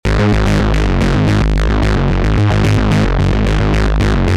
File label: Bass; PatchFactory